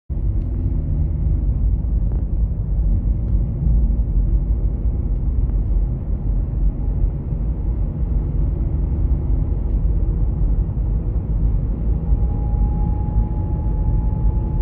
strange whining sound whine

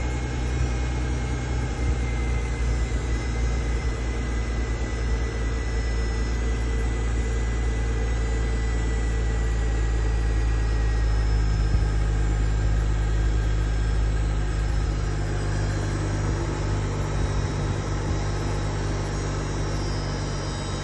strange noise cold start noise; c-class w204

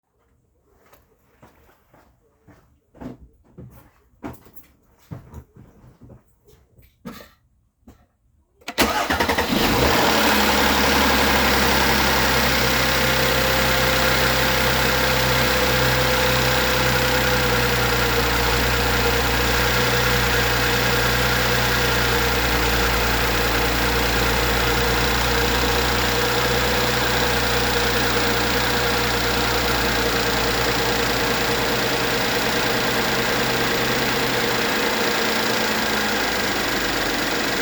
oil pump solenoids; w212 amg

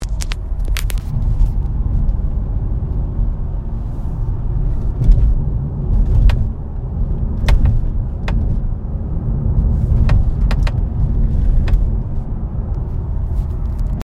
interior cracking noise; w212 amg

